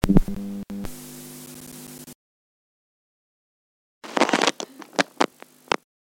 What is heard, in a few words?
plenka analogovie shumi analog tape noise